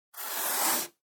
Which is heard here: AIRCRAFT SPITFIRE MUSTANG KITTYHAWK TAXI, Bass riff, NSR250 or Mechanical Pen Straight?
Mechanical Pen Straight